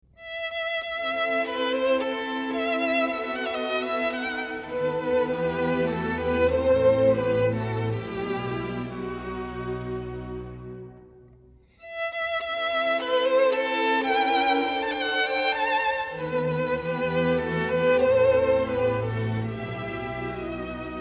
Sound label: Concerto for Violin and Orchestra in E Minor third movement; Felix Mendelssohn; Op64